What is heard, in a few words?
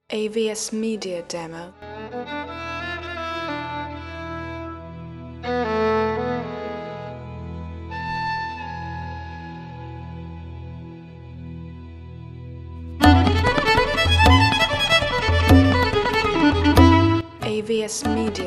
indian joy